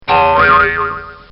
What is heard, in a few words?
Cartoon Sound Effects Bongs; Cartoon Sound Design